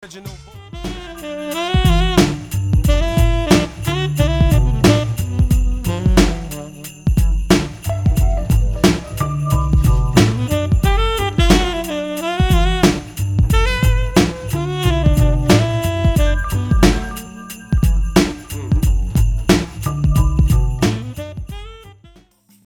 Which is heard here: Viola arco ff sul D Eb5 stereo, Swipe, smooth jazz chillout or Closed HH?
smooth jazz chillout